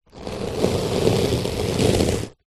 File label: paper fingernails run across scratch sheet of paper close up; zapsplat; foley